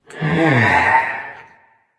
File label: sigh; cernunnos